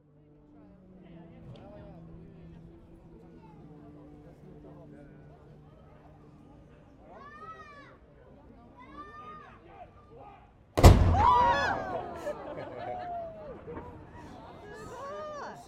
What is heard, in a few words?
Valletta Cannon Firing at Midday